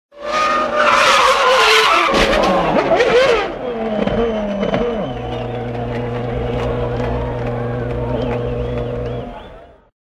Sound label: race sounds